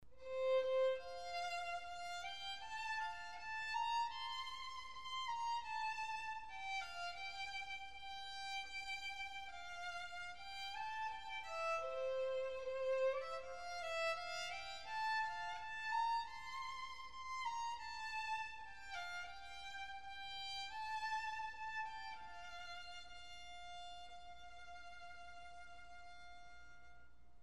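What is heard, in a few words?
violin; Simple Gifts; wedding prelude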